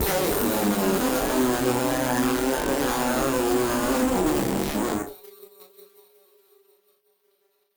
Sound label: Battered Synth; malfunctioning synth samples